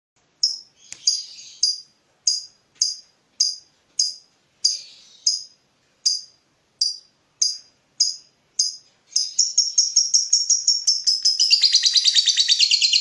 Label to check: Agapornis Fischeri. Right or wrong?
Right